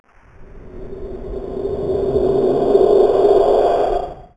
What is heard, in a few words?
spookie